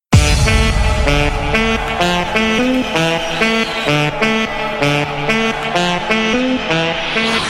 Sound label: trumpet oldie